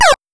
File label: enemy shot; Mega Man; gamesoundopedia